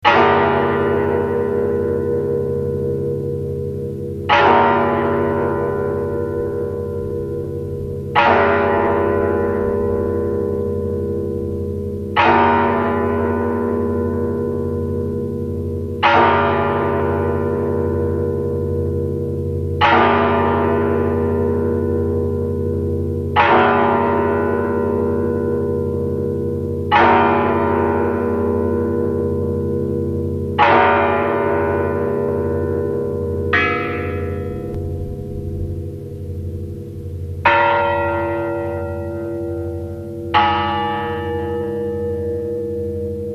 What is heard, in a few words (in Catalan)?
campana